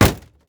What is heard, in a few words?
plastic hit